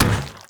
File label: Zombie Run Metal E, Footsteps; Warframe; Infested